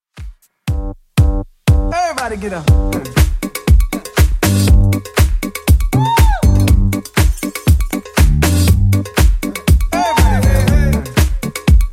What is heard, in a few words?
Redrum 120bpm